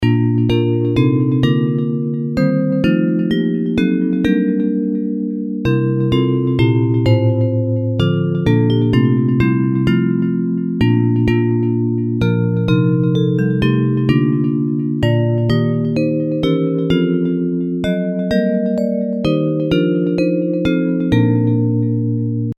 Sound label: Bells